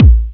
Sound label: K808 EQ, EQ KICKS; Masterbits - TR-Minator